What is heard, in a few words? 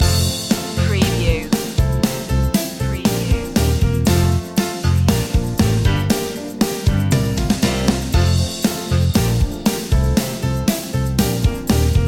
wake up pop loop